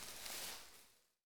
small animal scurrying; soundscapes

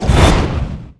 spider monster whoosh

